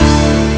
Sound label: cord; Amiga Soundtracker Sample Packs